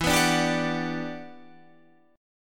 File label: E Minor 9th, down